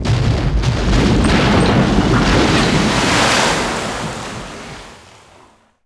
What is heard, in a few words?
destroy bridge